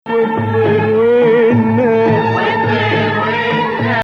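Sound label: Nawa Hijazkar, Arabic music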